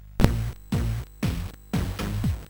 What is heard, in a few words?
sid, CSG8580R5; Saddamski